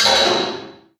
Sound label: Object Prison Bars